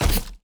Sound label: fly walk